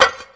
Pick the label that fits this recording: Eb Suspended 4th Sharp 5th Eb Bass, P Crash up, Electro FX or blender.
Electro FX